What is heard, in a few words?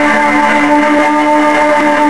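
engine fast loop; Quantum Redshift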